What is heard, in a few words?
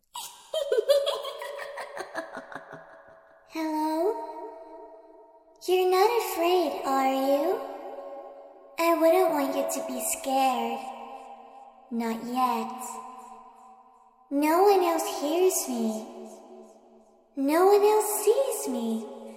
creepy little girl laughing and talking